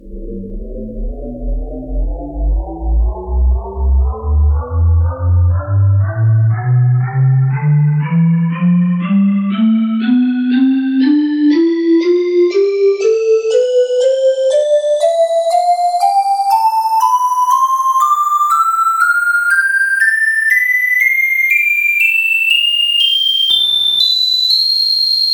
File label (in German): bottle chiff tonleiter